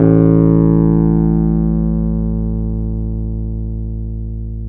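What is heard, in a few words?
piano Wurly; PNO; Roland LCDP10 Keys of the 60s and 70s 2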